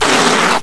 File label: BLOODCAT GROWL